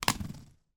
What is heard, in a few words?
leisure toy car drag racer release from starter unit carpet; dinky toys